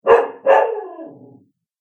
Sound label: Dog Bark Snarl; animal